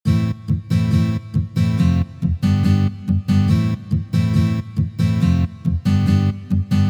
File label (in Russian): boj na gitare